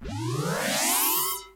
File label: right ramp; BALLY; TWILIGHT ZONE; Pinsound; Twilight-Zone-Full-Reorchestration